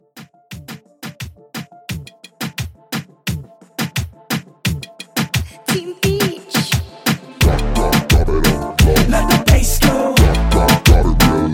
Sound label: Moombah Hype Rework 87 Dirty